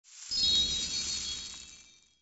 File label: single pixie dust